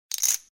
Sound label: chip, Blackjack